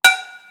High Clank

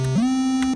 Square Switch Up

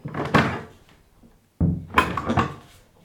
Door

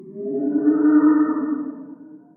Distant Roar

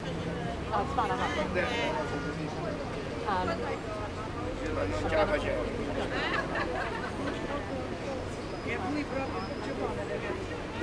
Valletta Streets Day

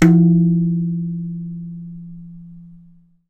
Bottle full

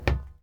Footstep Metal Crouch

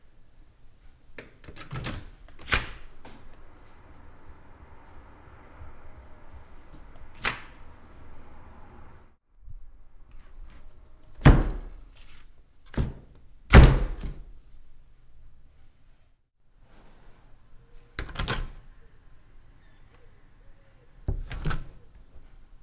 Window Big Wooden Open Close Rubber Seal Morning

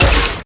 Burst

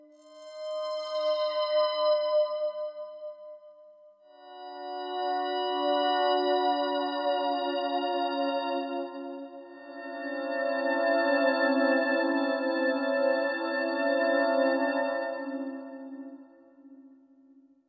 Discordant Voices

